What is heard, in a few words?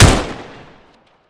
vhs; garrysmod